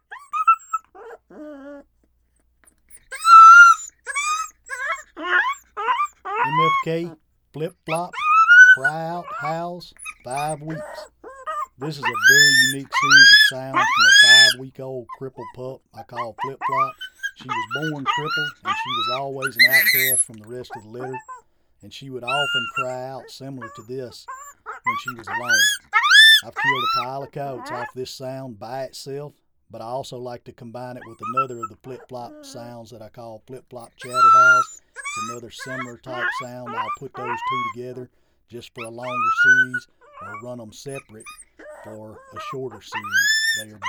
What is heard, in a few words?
Cry Out Howls 5wks; FlipFlop; Game Calls